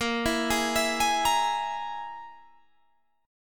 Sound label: E Major Flat 5th Bb